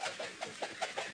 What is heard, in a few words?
SFX Flutter Loop